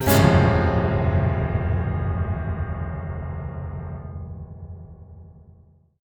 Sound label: Piano Bad Note Stinger